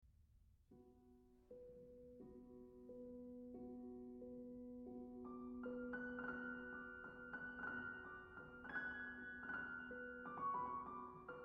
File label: Romanian Folk Dances, Piano Music